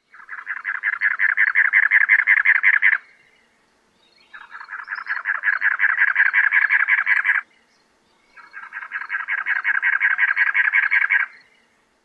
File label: song; TX